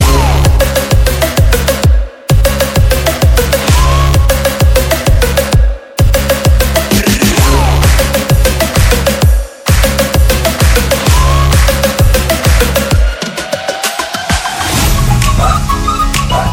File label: Beat drop